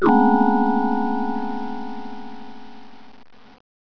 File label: bend down; Goldwave